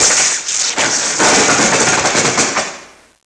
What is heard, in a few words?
zap, debris; XDM